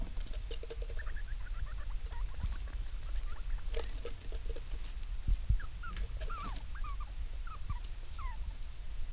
quietschfiepsen, octodons

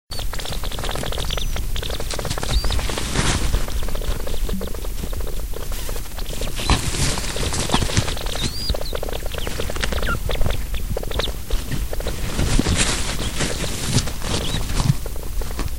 zwirp, octodons

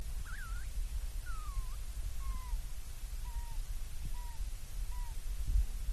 fiepsen

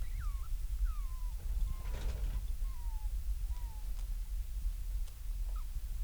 fiepsen, octodons

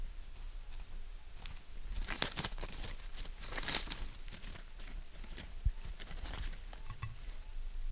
papierrascheln

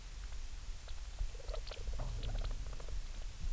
Fellpflege

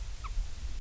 mucker, octodons

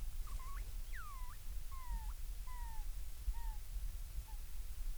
fiepsen, octodons

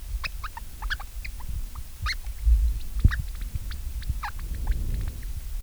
quietscheln, octodons

